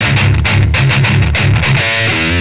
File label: Riff, Industrial, Nasty